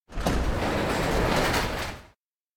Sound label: shutter lift; anemoi